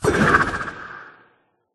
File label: trident riptide; Minecraft